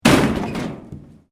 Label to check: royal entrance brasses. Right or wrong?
Wrong